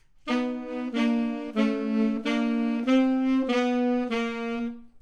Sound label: saxophones